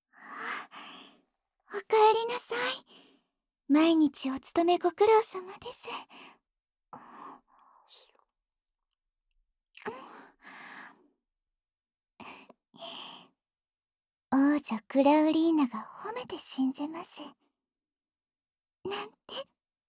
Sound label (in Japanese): おかえりのキス